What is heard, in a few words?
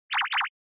Anime Motion Short